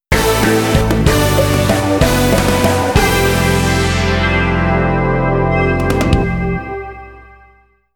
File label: GameOver; ΔZEAL; Shooting Love. 10th Anniversary XIIZEAL ZEAL Original Soundtrack